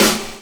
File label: SD, snare, 70 s Breakbeats